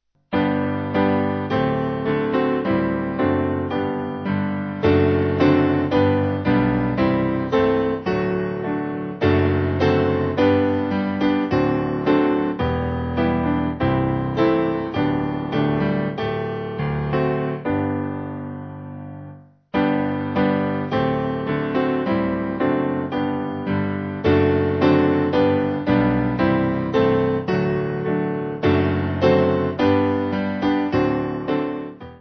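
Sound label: Piano, church music backing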